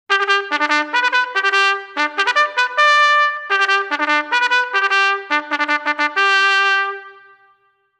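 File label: drill call, Ceremonial Music; Marine Corps Birthday Ball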